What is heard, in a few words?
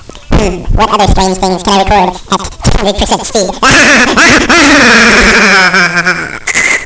ahaha